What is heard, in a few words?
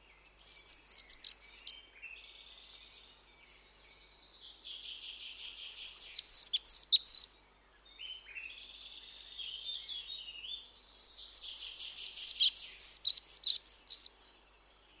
Semicollared Flycatcher song